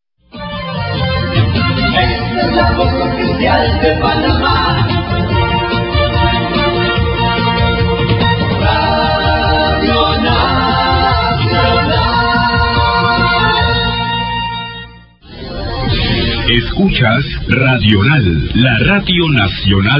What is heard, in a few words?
interval signal; radio nacional